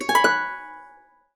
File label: HC C# MIN 11, Harps; USB Soundscan vol.62; AKAI